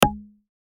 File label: key punch; mintty